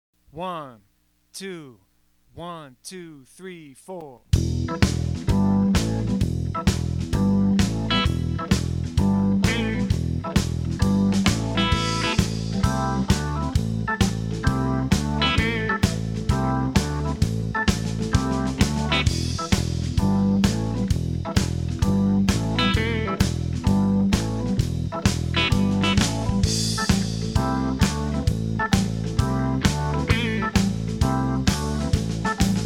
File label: Quarter Notes bass